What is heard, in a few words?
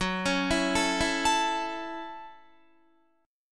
F sharp Minor 7th F sharp, Guitar; F#m7 arpeggiated; 14,16,14,14,16,16